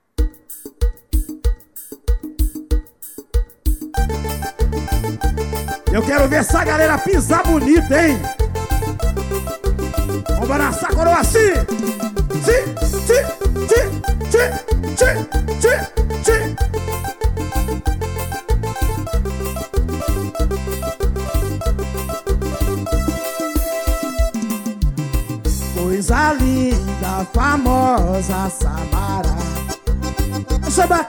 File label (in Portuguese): forro